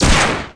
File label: fire rocket; SAS Zombie Assault 4; Android